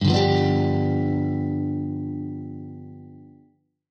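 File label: G7, Guitar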